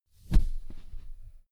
wing flap low; natur-tiere; voegel

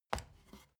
step ladder wood single; schritte

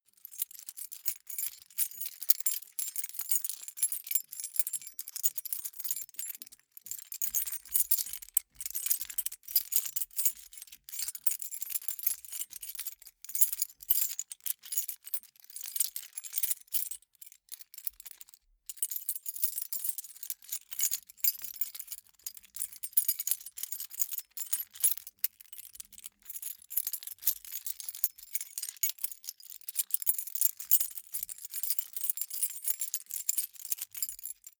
key ring mid size rattle sequence medium; objekte; schluessel

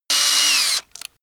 screwdriver free short; maschinen; schrauben drehen hydraulik

